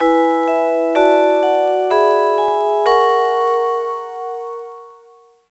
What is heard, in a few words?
Jingle Before Test; Kirihara Shoten Kanshuu Daigakusei Ryoku Kentei DS; NDS